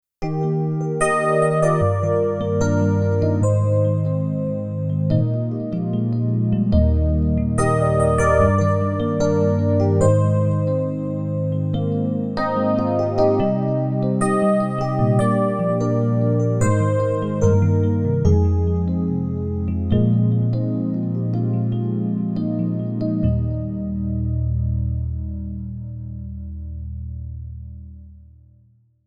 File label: FM E Piano; Electric Pianos; MP10